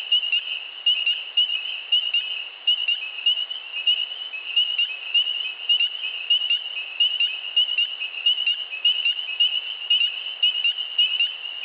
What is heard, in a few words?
Peepers in Woods; FROGS